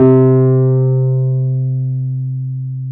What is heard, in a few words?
PNO, Wurly